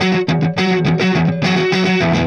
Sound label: Clinical Distorted Funk Guitar